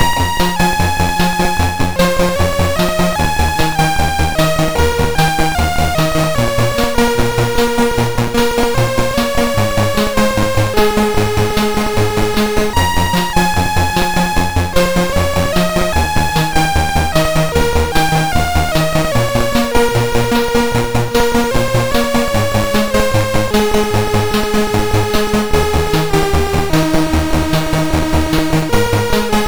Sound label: Commodore 64 Music, MOS6581R2